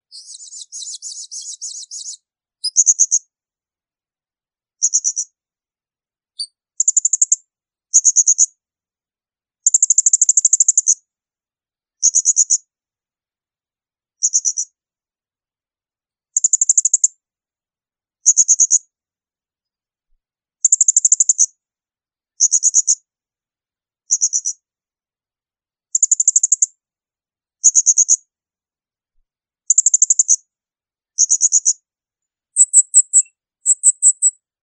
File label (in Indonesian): suara serindit